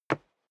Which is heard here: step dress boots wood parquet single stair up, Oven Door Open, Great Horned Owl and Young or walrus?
step dress boots wood parquet single stair up